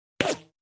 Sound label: Silencer Shot